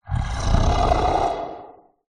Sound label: horror creature monster breathy growl; zapsplat